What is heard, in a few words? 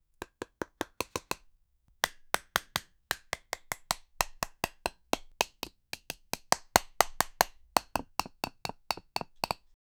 chitin armor creaking, alien